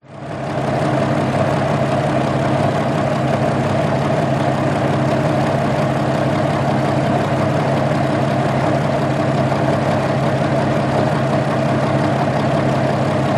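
warfare tank matilda mark 2 1939 engine idle close up above ext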